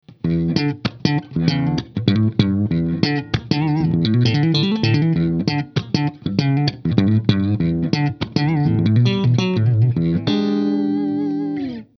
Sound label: ZIPPER HARD